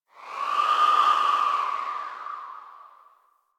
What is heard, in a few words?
Gas City Distant Wind Textures; Ambience; Warframe; Corpus Gas City